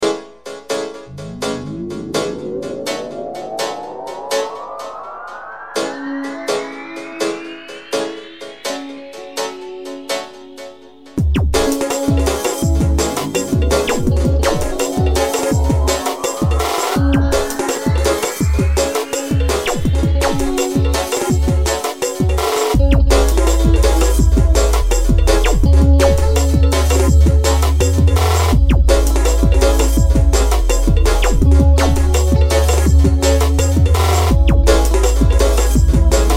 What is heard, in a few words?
Jungle Noise